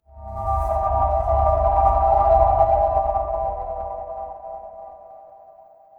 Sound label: horror drone